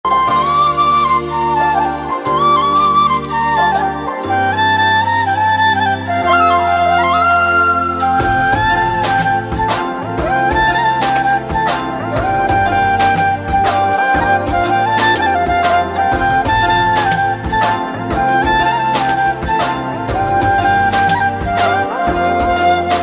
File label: ringtone, flute ringtone; indian remix guru